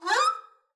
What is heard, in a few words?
double bass arco noise high short